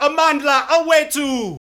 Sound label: Group Chants; Spectrasonics; Supreme Beats; African Contemporary; Yebo